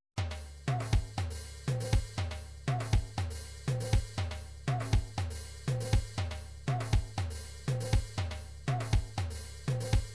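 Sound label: drums pattern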